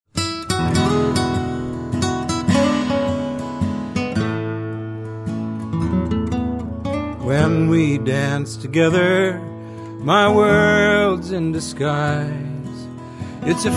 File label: waltz